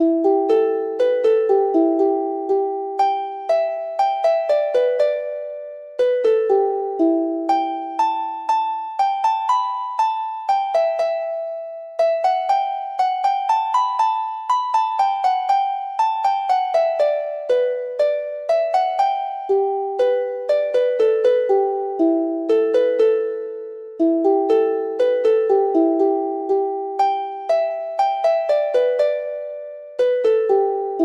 harp

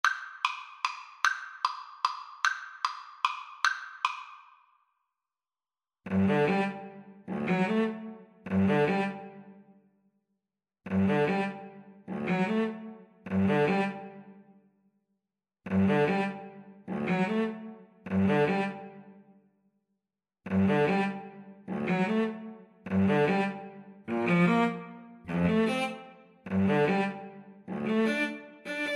Viola Cello Duet

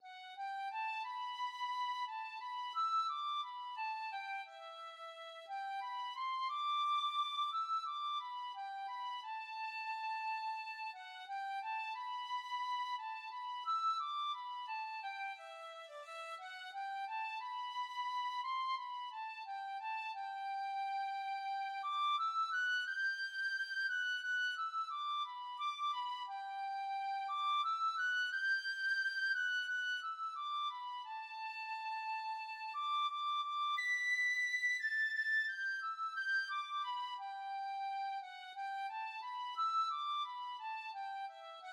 tin whistle

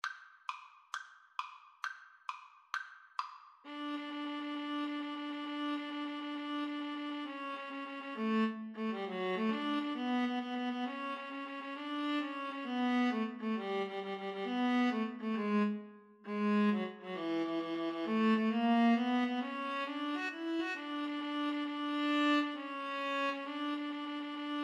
viola viola

